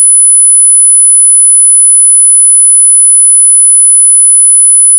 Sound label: 10 kHz